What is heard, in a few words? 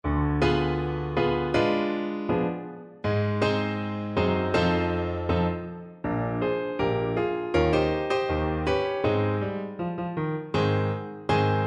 Tuba